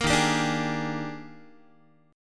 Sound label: Eb Major 11th, Guitar; EbM11; strummed